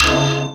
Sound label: OUCH HIT, METAL PERC; Zero-G Ambient 1